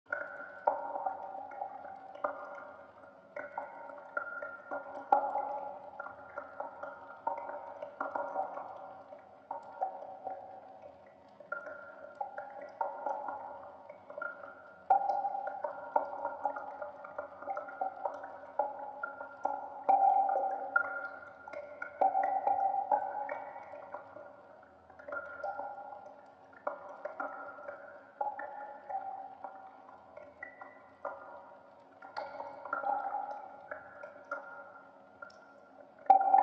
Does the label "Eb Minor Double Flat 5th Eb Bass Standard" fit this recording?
No